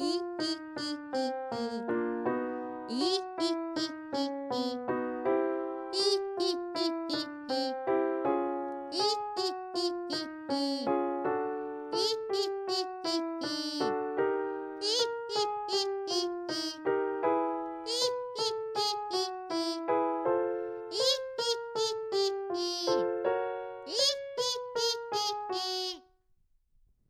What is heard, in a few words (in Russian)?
Ведьма Хихи нос плюс